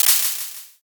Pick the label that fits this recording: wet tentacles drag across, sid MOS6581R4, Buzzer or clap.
clap